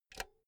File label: insert cinch